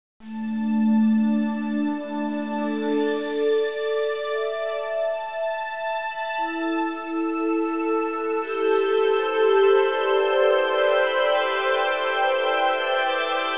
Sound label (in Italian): audio suonerie musica mistica